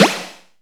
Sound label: Shock Snares, Percussion